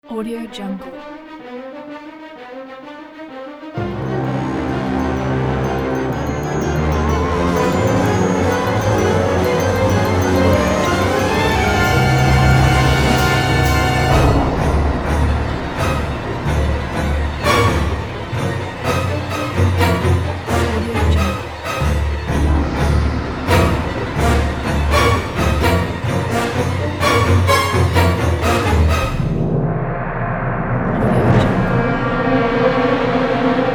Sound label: cinematic thriller trailer